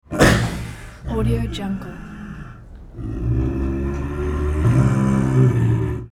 snow leopard

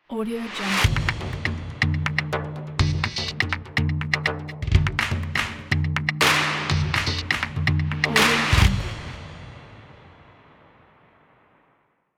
frame drum ident

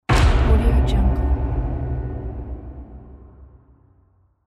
hit

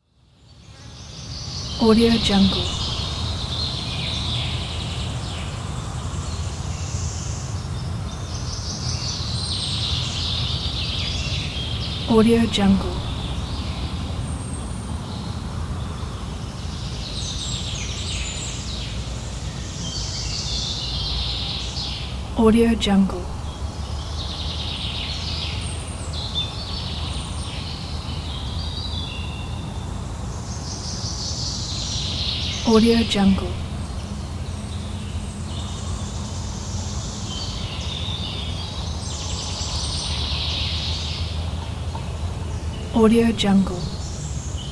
distant forest

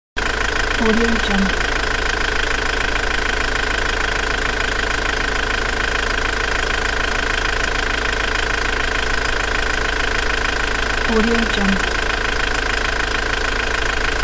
van engine loop; davidbeardmusicproduction

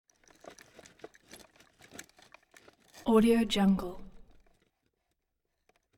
wheelbarrow rolling on dirt rattling scraping

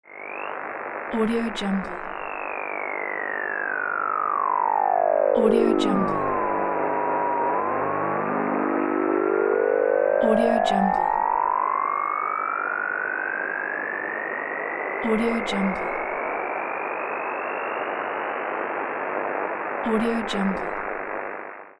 radio waves tuning; jdproduction

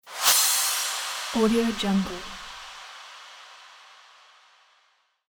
cymbal

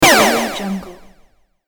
laser echo

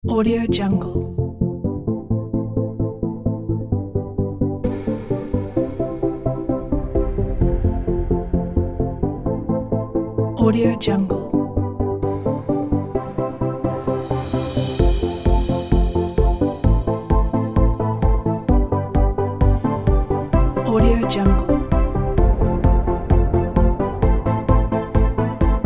ambient corporate; stockwaves

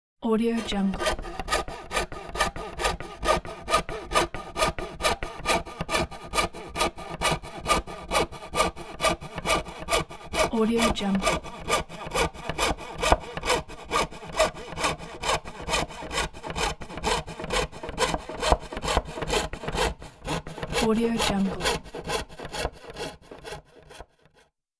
cutting wood

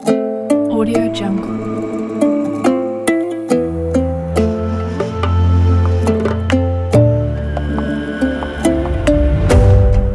kazakh dombra epic ident

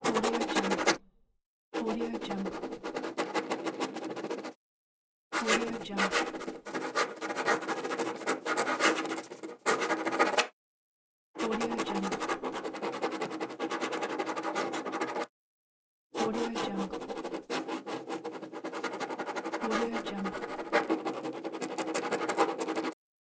pen

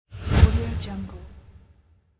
woosh

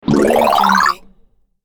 replenish health to restore life game sound

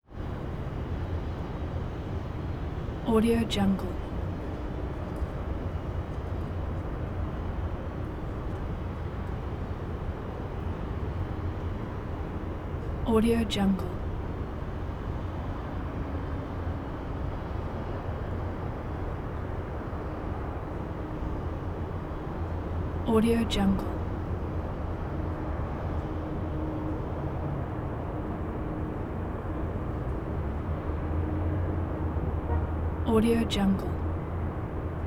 san francisco constant light traffic; prosoundfx

